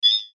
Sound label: Sci Fi Beep Electric, Sci Fi Beep Electronic Tone Signal; Tech; Blastwave